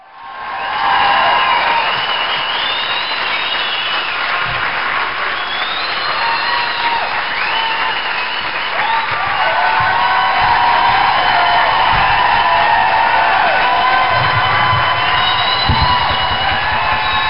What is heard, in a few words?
end perfect; Gutterball 3